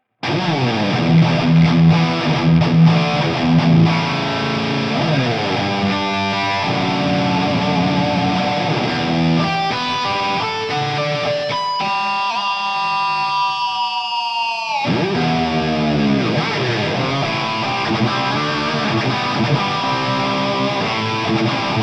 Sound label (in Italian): HI GAIN; ik multimedia tonex pedal